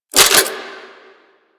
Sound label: Spin C; Warframe; Zylok Prime